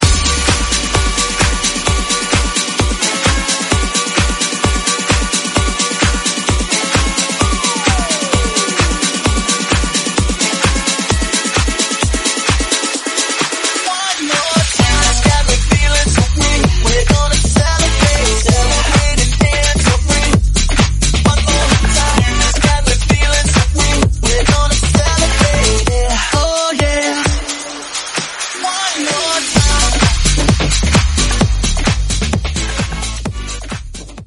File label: Tech House Snippet 130bpm D